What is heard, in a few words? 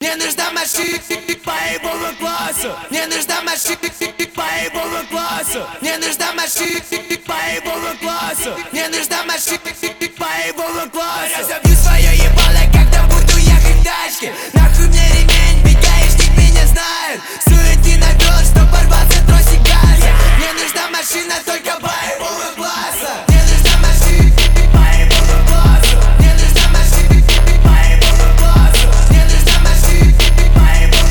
Phonk